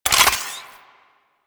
Modular Secondary Handle Recharge Finish, Guns; SU Modular Guns; Handles

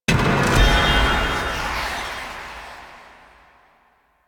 Puzzle Success; Ambience; Orokin Moon; Warframe

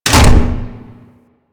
Barrel Drop; Ambience; Warframe; Grineer Galleon

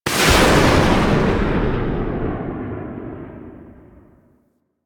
Dropship Depart C; Warframe; Quests; The New War; Narmer Outpost

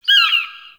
Mountain Forest Predatory Bird Far

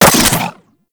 Seer Fire Mech, Weapons; Warframe

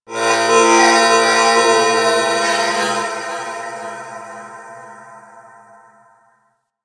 Temple Moving Bells; Ambience; Warframe; Corpus Ship Remaster

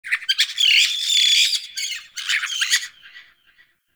Bird Alerted Caique Call; Midrath Island; Wildlife

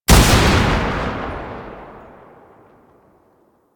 Sniper Shot; DE; Warframe; Frumentarius; Exalted Sniper

